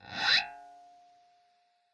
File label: scrape, glowly bass